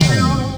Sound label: DANCE FX